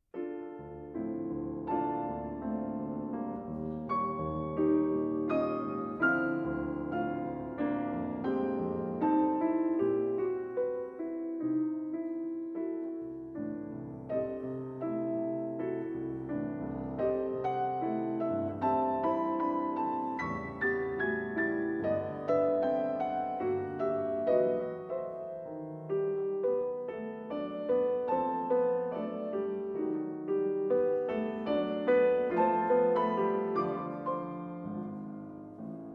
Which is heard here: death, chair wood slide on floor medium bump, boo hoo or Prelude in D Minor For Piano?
Prelude in D Minor For Piano